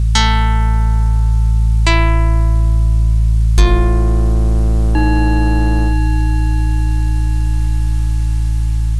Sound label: hook